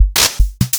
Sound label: Fricke MFB 502 Kit